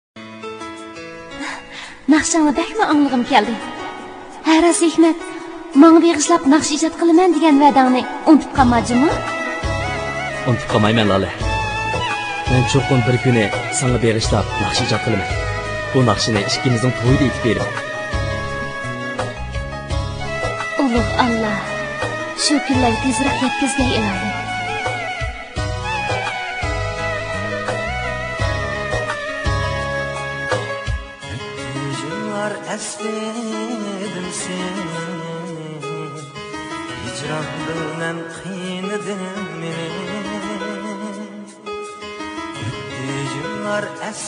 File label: Uyghur music